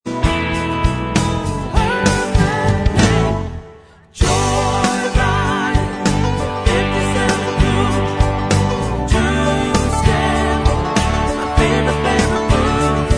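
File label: Bvox Track, backing vocals track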